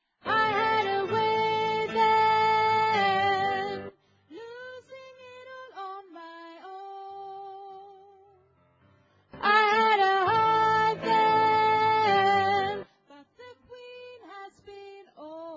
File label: tenor